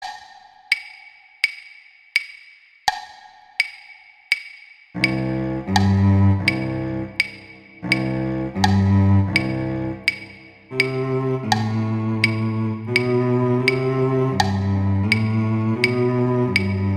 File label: Duet Cello I; Duets; Mozart; Duet 5